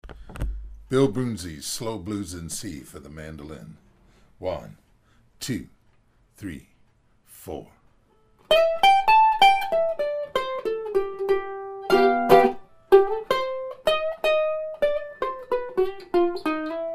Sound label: Slow Blues In C, mandolin